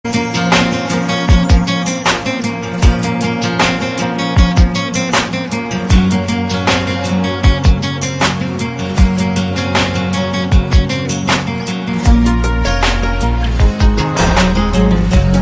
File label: Love tone